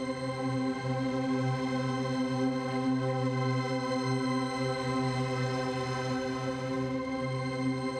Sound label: Drone; stereo toolkit samples; Non Tempo Loops